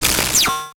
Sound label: Pop Up Electronic; Multimedia Internet CD-Rom Flash